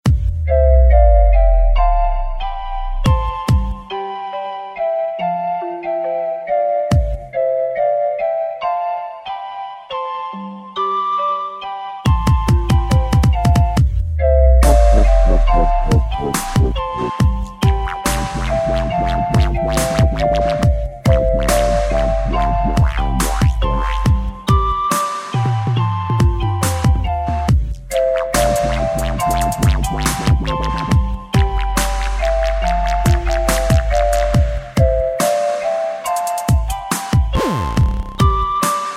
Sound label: dub